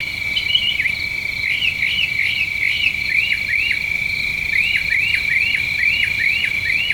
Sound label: night bird loop; nimbus station